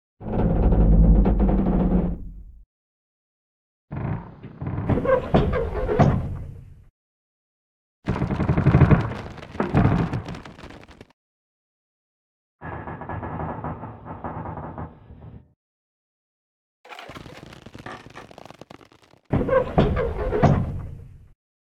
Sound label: windmill